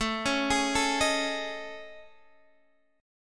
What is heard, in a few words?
A Major Sharp 11th, Guitar, Standard; AM#11 arpeggiated